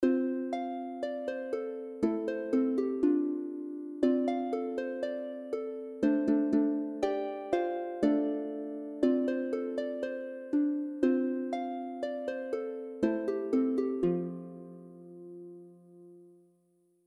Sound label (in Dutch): chinese bamboefluit